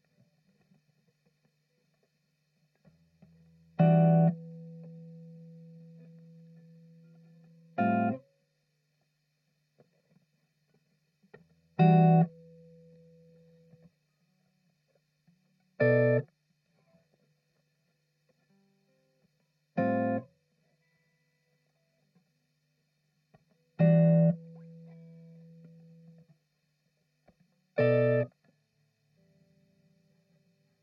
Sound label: Em 120 funky groove